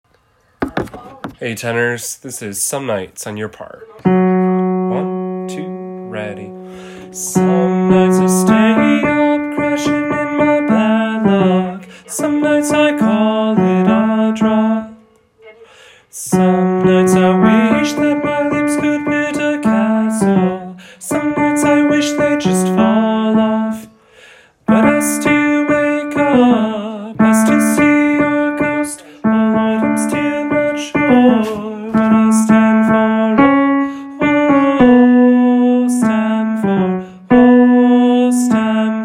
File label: tenor